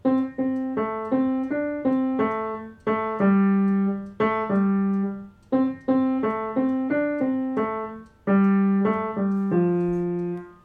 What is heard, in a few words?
trumpet man